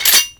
Cannon Mech; Grineer

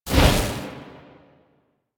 Fireball; Warframe; minigames; Caliber Chicks minigame; boss

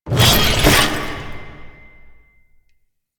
Customization UI Equip; Veilbreaker; Kahl

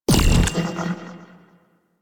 Fan Energy Trench Projectile Burst; Warframe; Protea

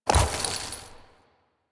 Horse Brakes Trot; Foley Actions; Warframe; Vehicles; Duviri

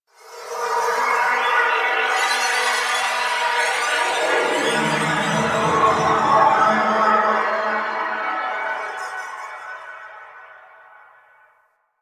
Interior Texture, Ambience; Warframe; Quests; TheNewWar; Cetus; Unum Tower

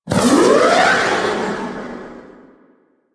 Animal Conservation Drone Exit; Warframe; UI

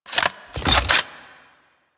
Shotgun Reload B; Weapons; Warframe; Tenno; Alchemist

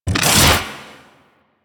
Leap Whoosh; Enemies; Abilities; Warframe; Corpus; Arachnoid Hunger

